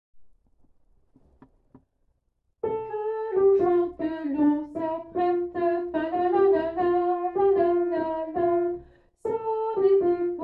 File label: Falala Alt Bas